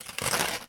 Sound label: cardboard rip; foley